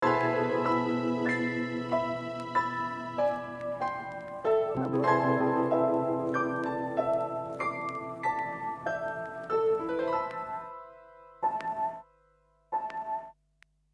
Melody; PixelJunk Shooter; PS3

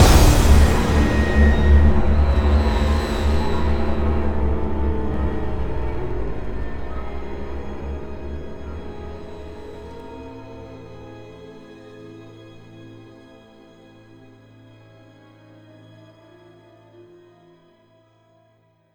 Ambient Sting; Prototype

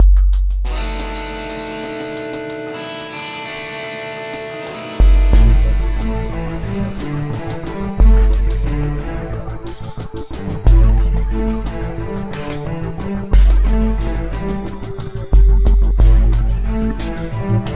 Lose; Yu-Gi-Oh! GX Tag Force 2; 2007 psp

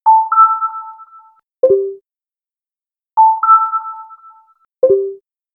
Controller Home Menu Button; Wii U System Audio

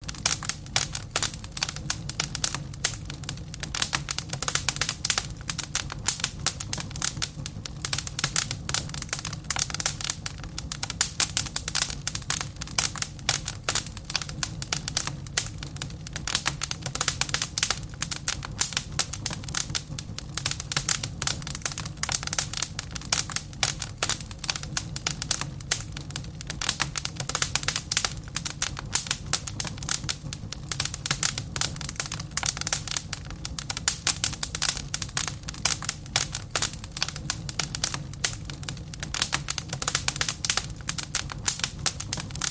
ambient bonfire

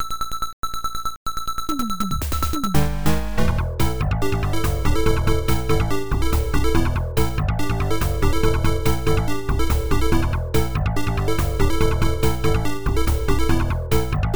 Stage Clear BGM; burning dragon plus pc 9801 opn

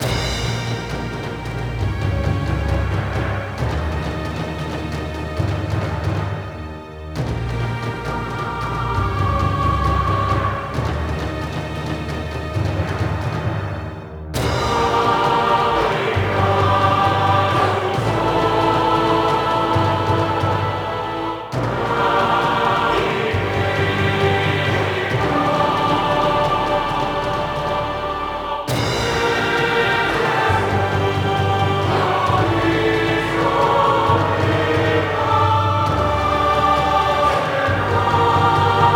Tension Loop; Tension2; Might and Magic Clash of Heroes

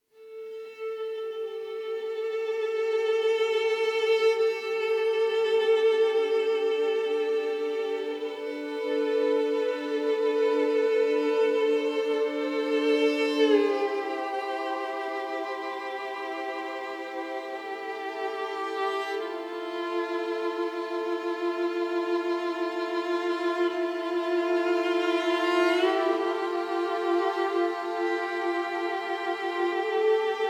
Cinematic; Get Even

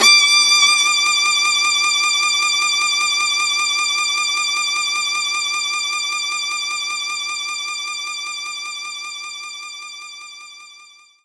Viola D6

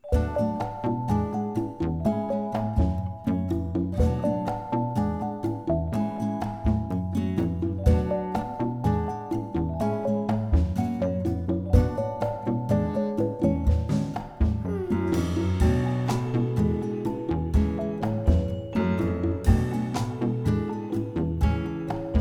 Social Hub Ambient Low

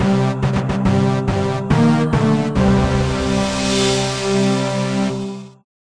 Start of duel; Duel Arena

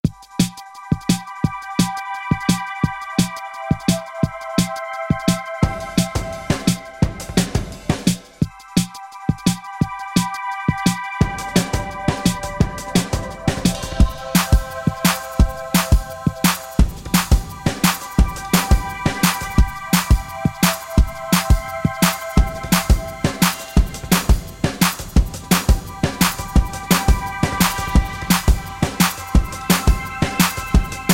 Drum & Bass

